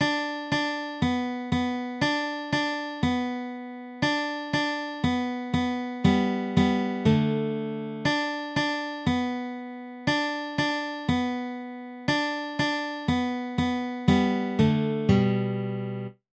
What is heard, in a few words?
guitar